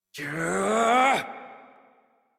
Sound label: Exert Adult, Melee; Warframe; Duviri; Drifter; en